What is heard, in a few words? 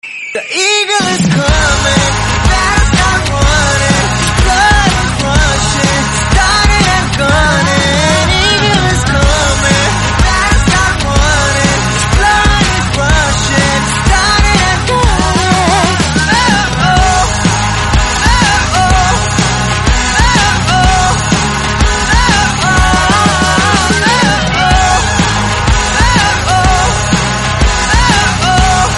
Bgm Tamil Ringtone; Vikram